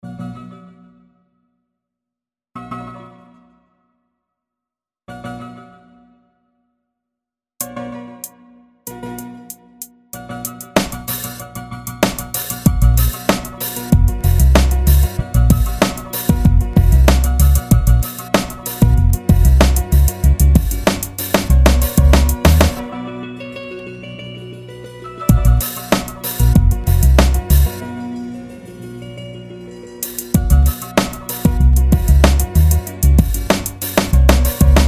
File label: 90bpm freebeat